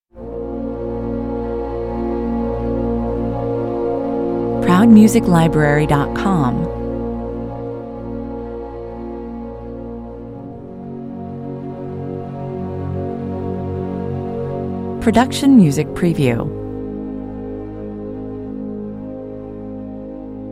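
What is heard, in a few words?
strings only, A solemn and heartfelt underscore for film / TV. Fragile, tender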